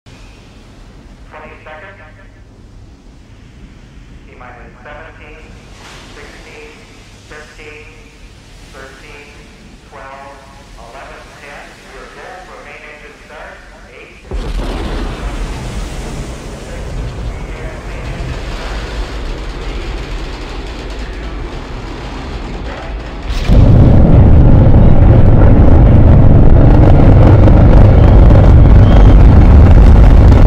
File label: space shuttle; پرتاب شاتل فضایی